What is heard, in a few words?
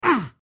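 take damage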